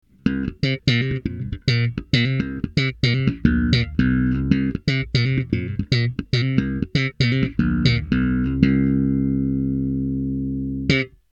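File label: fat tube slapped, Bass Driver D.I.; sansamp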